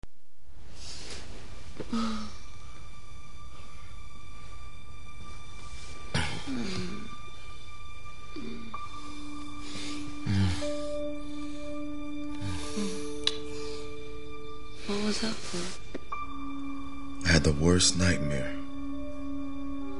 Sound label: strings